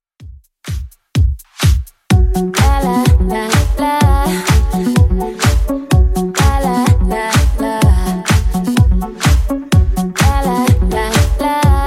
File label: 2ks Redrum 126bpm